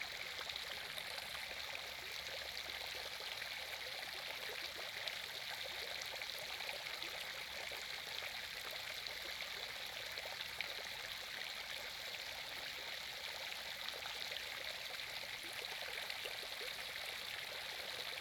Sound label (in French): jets d eau; trizay